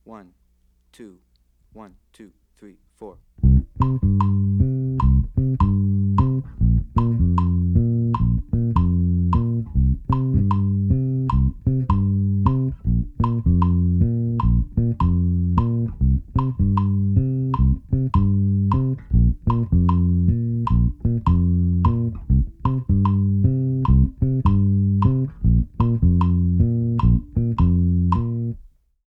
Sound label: bass mambo and son montuno two chord vamp son montuno